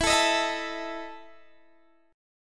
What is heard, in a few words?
E Major 7th Suspended 4th E down Guitar Half Step Down